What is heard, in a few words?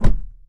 Car Door Close Mono; Smashy Road Wanted 2 android ios switch gamerip 2020